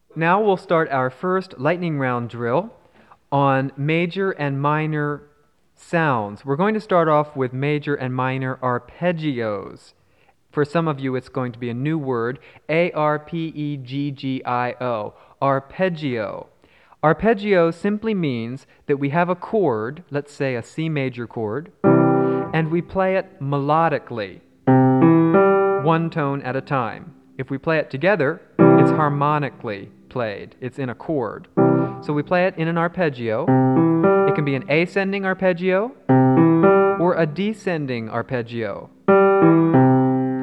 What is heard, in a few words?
Arpeggios Intro